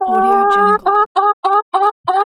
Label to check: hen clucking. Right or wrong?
Right